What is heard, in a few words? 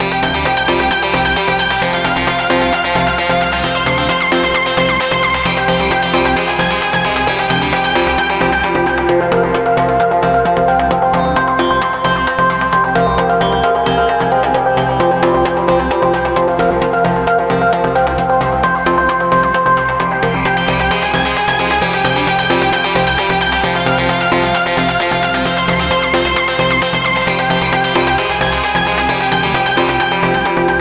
Kick filler; Novation Launchpad; Ableton